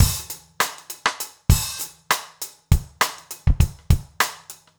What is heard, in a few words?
basic funk rims